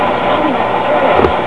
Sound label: ghost